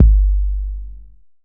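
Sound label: bd, 808 bass drum; Sound & Vision - Gigapack I; DANCE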